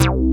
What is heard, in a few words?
MOOG BASS